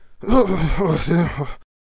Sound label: Traveller Attack Vocals